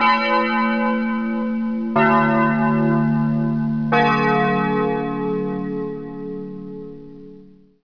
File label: bell